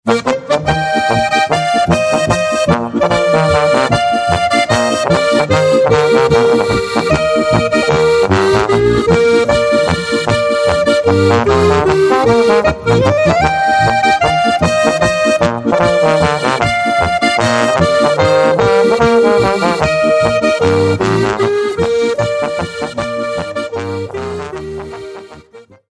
polka

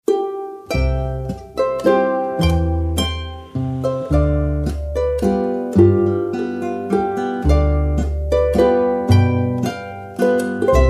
orgel landler

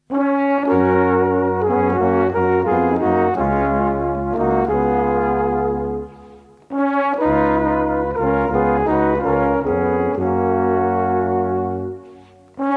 weis; pfaffendorferweis

